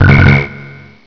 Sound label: Ticker; Bombzuka; Retro